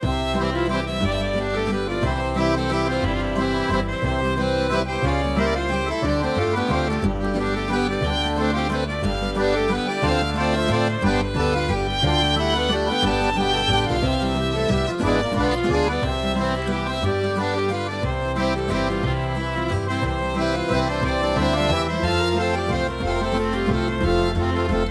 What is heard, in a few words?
Town Tavern